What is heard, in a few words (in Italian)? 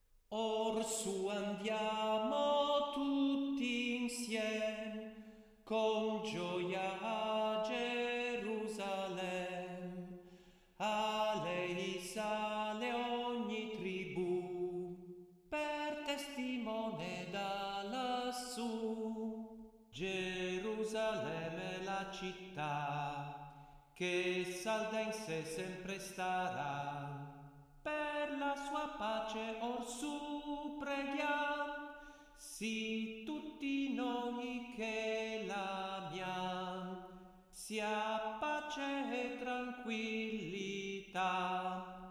Salmo canto; salmi 121 135